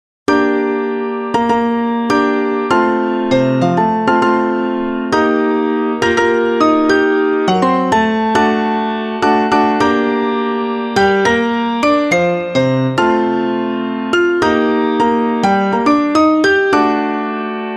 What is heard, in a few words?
piano